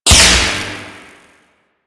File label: Shipyards Robot Laser Activate; Warframe; Grineer Shipyards